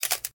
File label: camera click; TP-LINK; Neffos C5